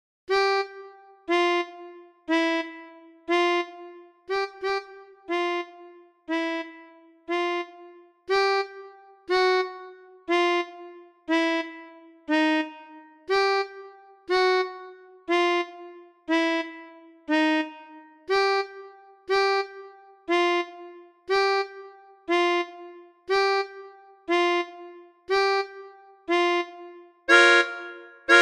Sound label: melodica; Gayane act tune